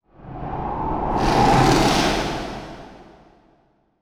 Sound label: glacier cast; weapons; dota; invoker